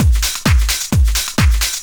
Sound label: drum 130 dance, drumloops